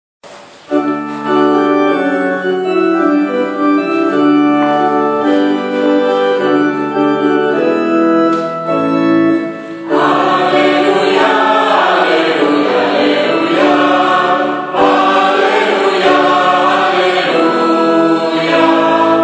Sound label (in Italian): Alleluia, canto per Cristo CORO; BIBLIOTECA VATICANA; Italia - Testo di Eugenio Costa su motivo tradizionale inglese